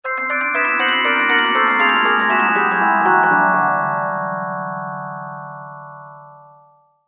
Haunted Chimes, Scary Tone Sound Effects; horror

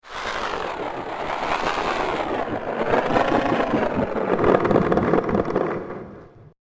Glitch Time Slows Down, noise music; Andrew Fleming

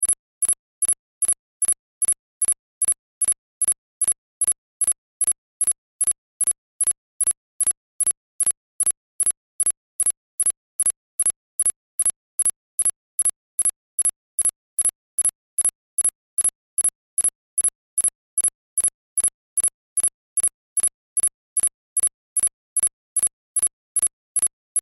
Science Fiction Computer Beeps Loop; Computers

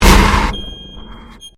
Convolution Pulse

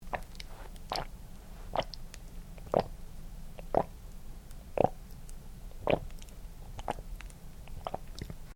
MALE DRINKING STRAW SWALLOWING; Male Vocal sounds; Drinking (Sound Effects:Human)

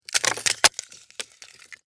Impact Ice Crack, Large, Sheet; Cracking; Objects; Blast Wave

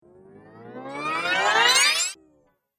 Multimedia Transition; Transition Sound Effects; Andrew Fleming

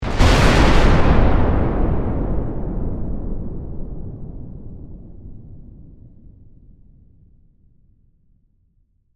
Explosion Massive, Warfare, Explosion, Massive; Bomb Sound Effects; Explosions

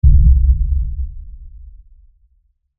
Impact Lfe Blast Rumble; Blasts (Sound Effects:Impacts)

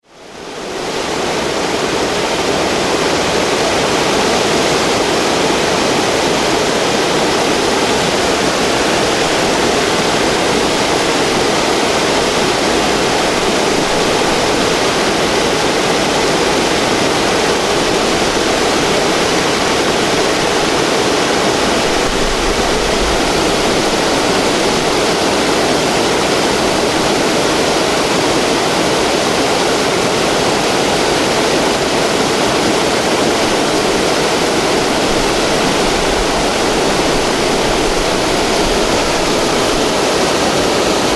Waterfall, Large waterfall.; Nature

